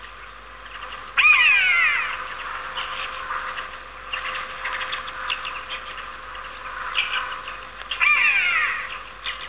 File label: buzzard; the call of the Buzzard can be heard on a regular basis